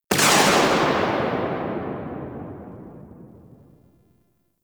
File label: Arch Railgun Charged 2D Tail; Warframe; Archwing